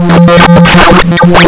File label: lsdj waves; wavetables